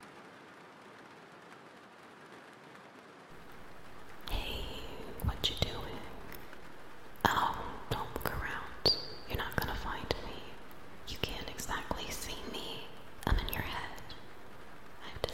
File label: 8D Ambience; In your Head